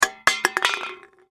Foley Soda Can Drop Aluminum; Drop Sound Effects; Drops (Sound Effects:Objects); SFX Bible 6

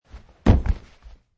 Landing

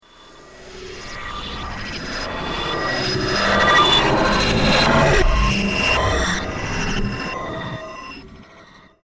Intergalactic Space Transmission, sci fi

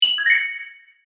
Multimedia Chime, Technology, Multimedia, Chime

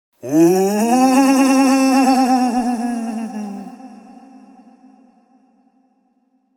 Male haunting ghostly wail; Ghosts; Horror

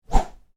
Swish small fast, Swish: Short, Fast; Whoosh Sound Effects; Whooshes (Sound Effects:Sound Design)

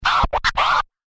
Record Dj Scratch Spin; Musical; Blastwave; Sound Effects:Musical

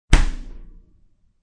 Refrigerator closed.

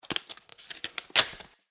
Household Paper Plates Separate, kitchen; SFX Bible 6

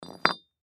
Brick Set Down; Blastwave; Foley

